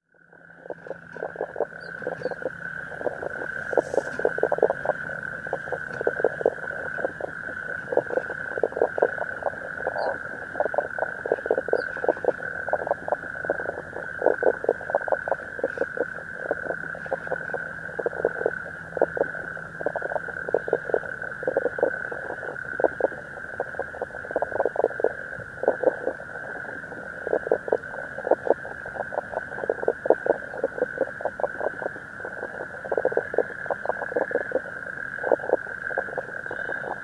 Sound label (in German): Knoblauchkroete